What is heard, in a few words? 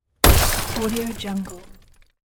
car window smashed